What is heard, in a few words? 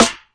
classic hh Com Snr, Classic Hip Hop; STYLE KITS (Hip Hop, Etc); Classic Hip Hop Mega Kit